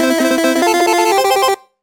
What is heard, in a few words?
level up; Game Events